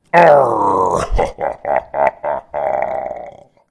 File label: pirate laugh; cs16; ze landlubbers fabi